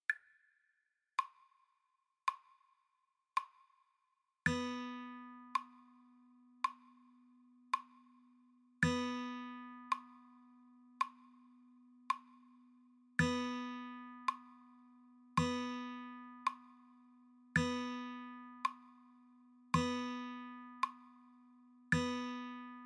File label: rhythm practice slow, guitar